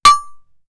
Hit Lock

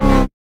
Boss Core Hurt; Avie Boss

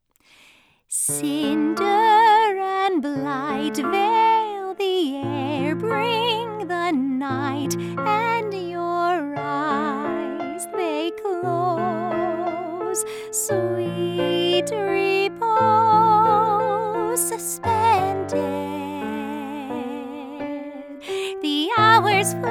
Theme (English Vocal Version); Theme of Bloodstained; Bloodstained: Ritual of the Night

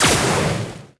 Drift Ind Last

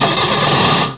Menu Car On

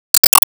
Enemy Train Light On

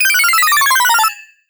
Start; SE Ing Other Rule#06 (SE Hakt00 Start)

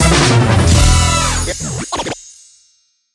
Mystery Box Open Rare Rarity; Subway Surfers City Sound Effects; Android

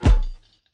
Swing Impact; Joblin Joblifter

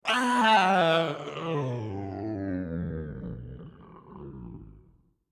Death

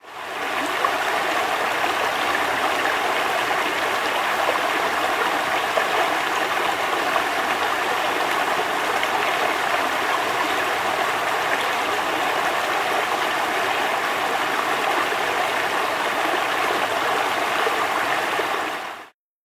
River; Google Earth VR

